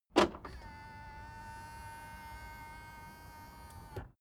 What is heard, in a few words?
car lock remote automatic